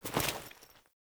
Female Foley Action Default Melee Heavy Swipe, Female Default Clothing; Characters; Player